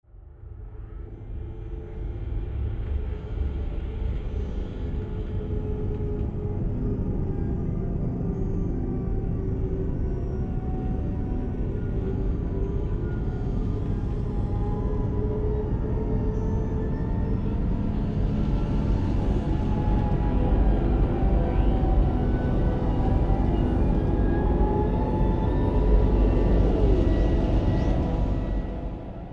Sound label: Morphestra Single